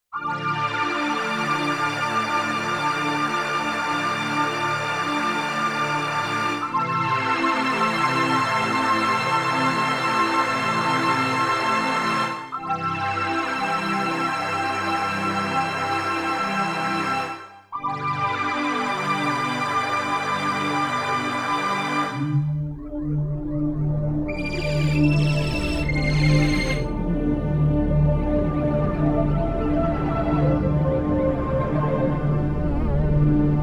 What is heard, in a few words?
Deep Waterspace, Spacey Keys